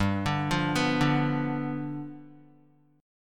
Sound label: G 7th G, Guitar; G7 arpeggiated